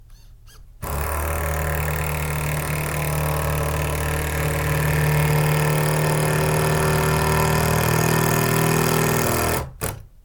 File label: remodeling